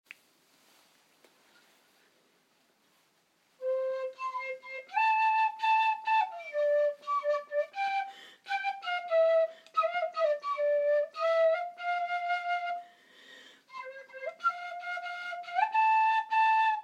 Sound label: playing c whistle